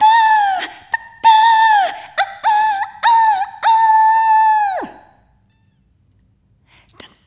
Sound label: Rhythmic A